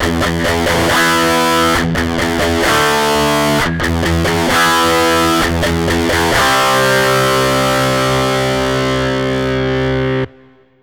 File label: DI 4x farm C2, amp samples